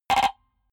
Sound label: button, signale; soundpool